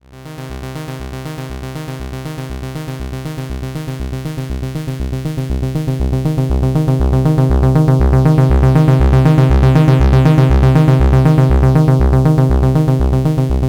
Mixerdrive

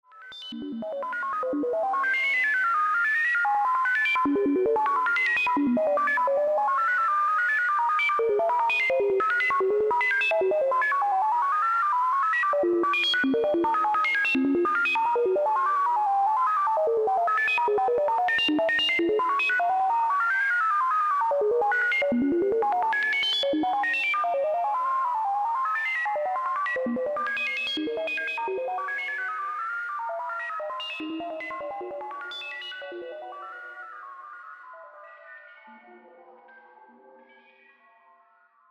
Serge Crocodile Computer

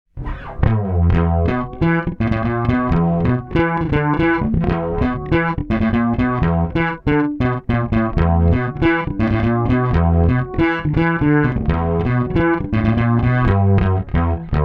Chorus full fretless

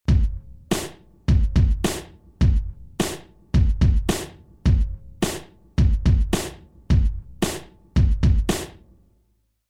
Mooer Synth Drum Patch Hip Hop Kick Snare

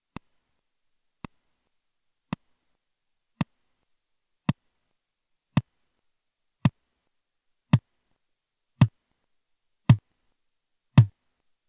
Drum PCM noise; IKM Uno

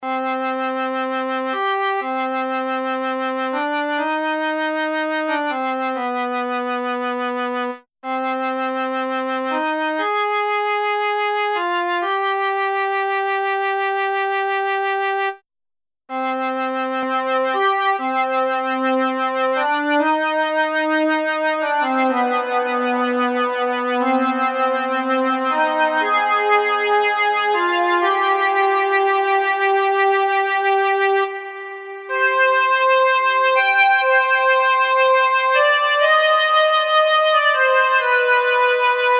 ROLAND FANTOM JUPITER-8 Model Expansion

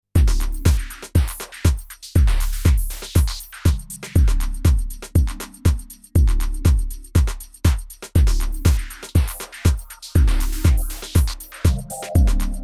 Korg drumlogue

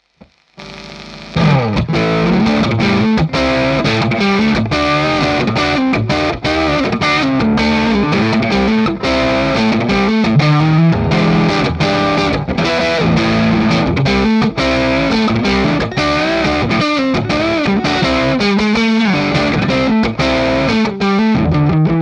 Twang Combo Tough Tweed; iAxe 624

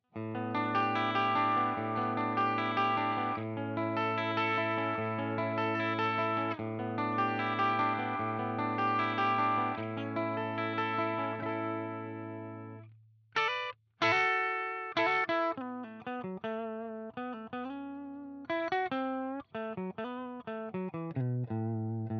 clean bridge normal split; HB CST

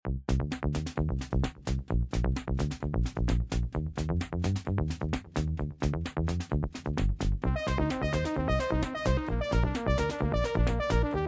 cs80 seq

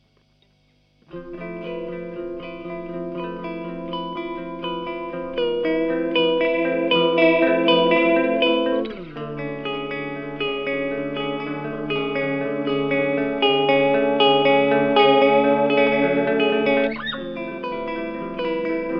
Earthquaker Devices Aqueduct Shoegaze

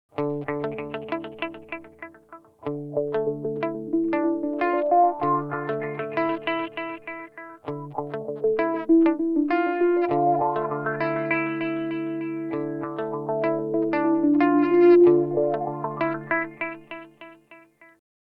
Sweeper; Source Audio Nemesis

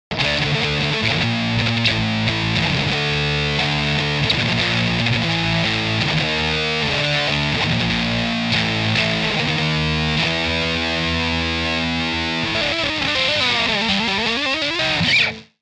Power Chords lead 4x12

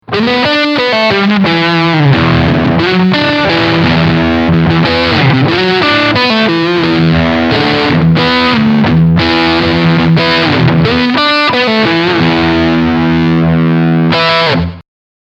SC Front Mitte Overdrive

